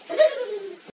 Giggle

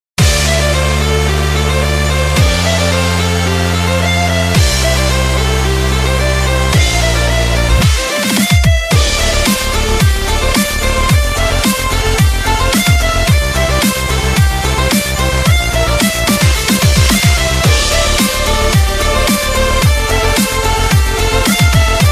Remix

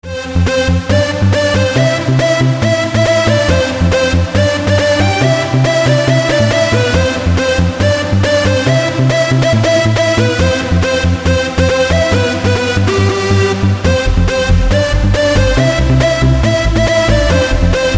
acoustic

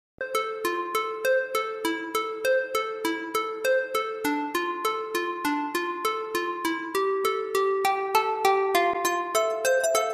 guitar, ringtone

